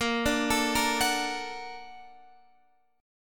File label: Bb Augmented Major 7th Bb, Guitar Standard; Bb+M7 arpeggiated; JGuitar